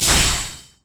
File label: power transfer return; LoL; viktor